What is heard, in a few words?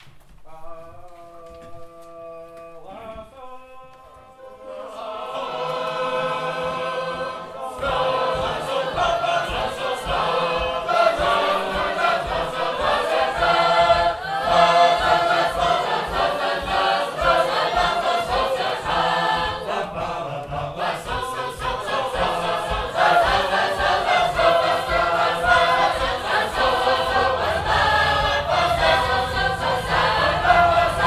Sacred Harp; Schenectady